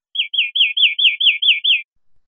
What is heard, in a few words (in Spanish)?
piadas corridas, verderon